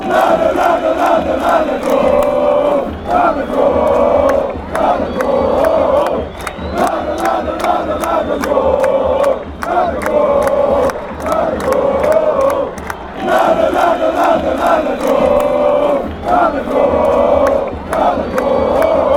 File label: cska chant